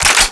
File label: AKS; svencoop; paranoia; weapons